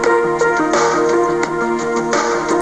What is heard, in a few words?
sunrise small drums